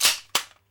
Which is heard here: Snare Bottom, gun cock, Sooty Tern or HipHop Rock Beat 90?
gun cock